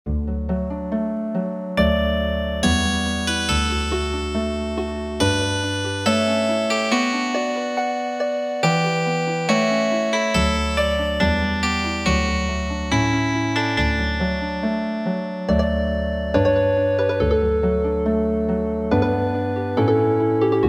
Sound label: Healin Harp